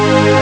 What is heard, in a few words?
synth accord; Amiga Soundtracker Sample Packs